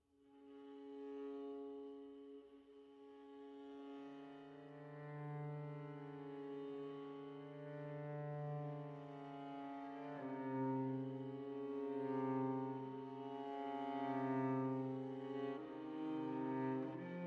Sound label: string quartet andante